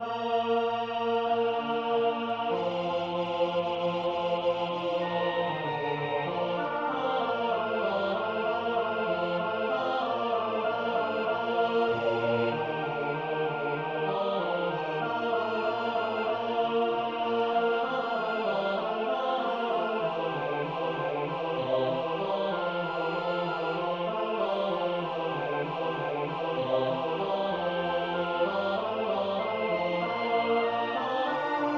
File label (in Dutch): Bas, koor; G.B.Sammartini; Magnificat